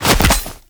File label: Glaive Catch; Enemies; Weapons; Warframe; Teshin; DE